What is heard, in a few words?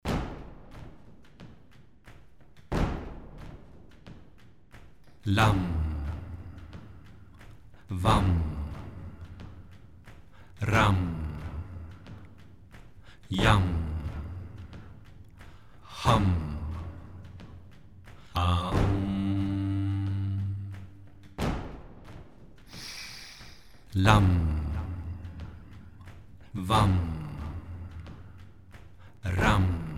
Meditation Lam Vam